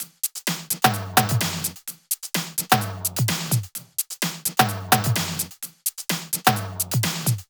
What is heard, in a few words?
Loops 128BPM, Drumloops